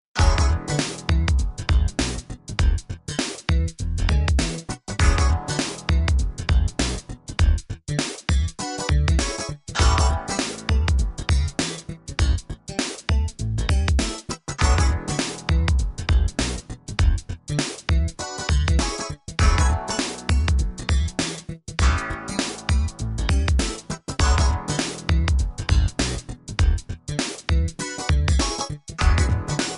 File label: Funky One